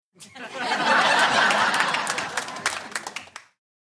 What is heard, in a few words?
Crowd Applause Light, medium